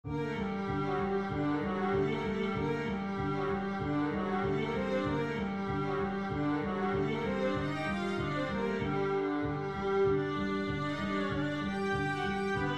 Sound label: nahawand full